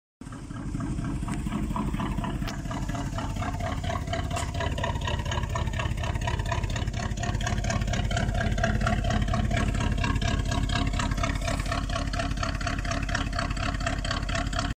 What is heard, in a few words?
oldsmobile; آمریکایی; الدزمبیل آمریکایی